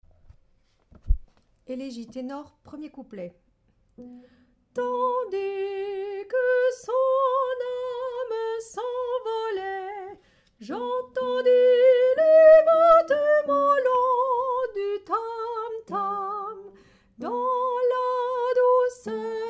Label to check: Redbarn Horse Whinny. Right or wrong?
Wrong